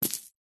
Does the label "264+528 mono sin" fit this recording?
No